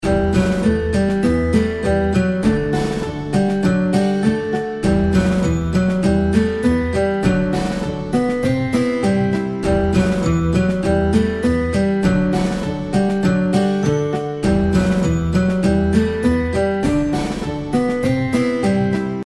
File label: outro bgm; California Gold Rush